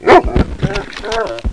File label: dog punt